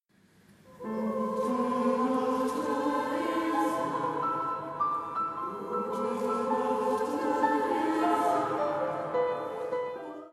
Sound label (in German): Gute Nacht Bach meets Jazz